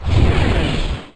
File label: Torpedo; Piranha